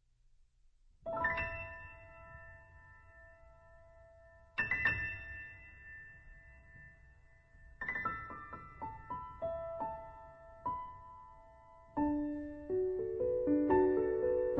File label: Naked Piano